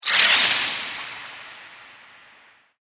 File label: Magic Wand Escape Spell; fantasy sound effect